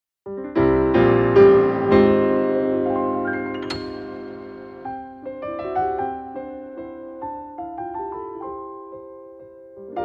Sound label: 20 layer piano; Wiwi Digital music technology